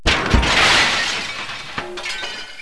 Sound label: plate glass